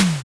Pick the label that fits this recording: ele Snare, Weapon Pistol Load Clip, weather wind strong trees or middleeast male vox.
ele Snare